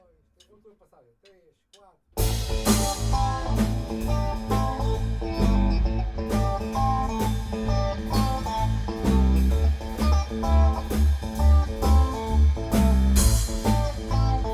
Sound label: Blues